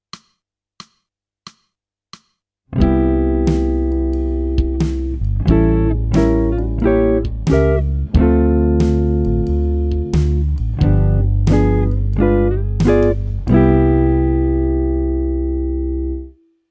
jazz

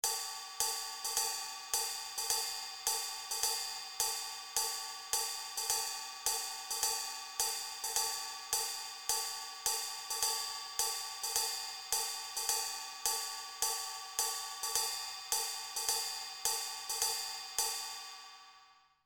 Jazz

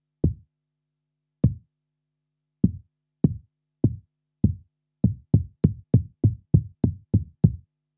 BD

